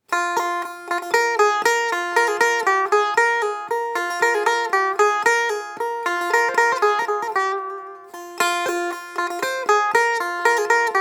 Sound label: Kinder Tenbur